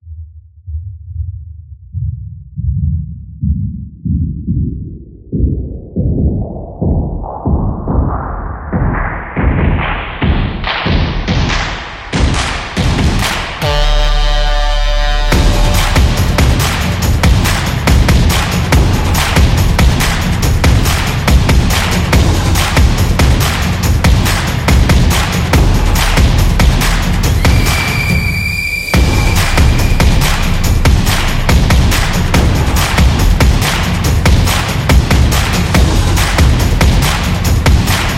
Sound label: The Sport Drum